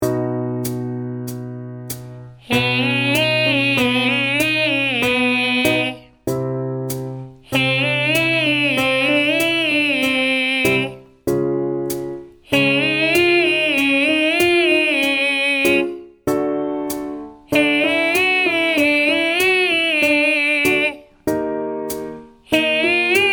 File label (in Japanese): ボイトレ 息漏れのない裏声 練習トラック